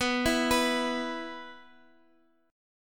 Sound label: E 5th B